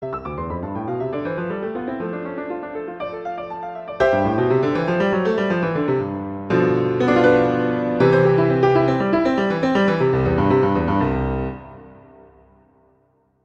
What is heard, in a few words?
Prelude IV C major; Clementi; Preludes